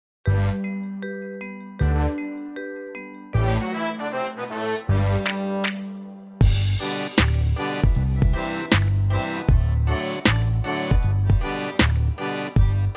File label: Instrumental